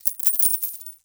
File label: Coins Poured Out